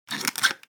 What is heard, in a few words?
Rusty garden shears cut snap